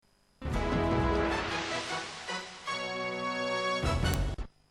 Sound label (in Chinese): 片头音乐